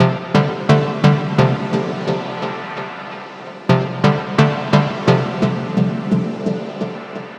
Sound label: Synth Loop 130 C, Techno; Loops; Synths; GhostHack; Ghosthack - Free Techno Sounds 2024